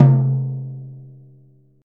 Classic Deep Tom Drum C Key, Royality free tom single hit tuned to the C note. Loudest frequency: 735Hz